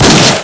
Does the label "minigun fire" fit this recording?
Yes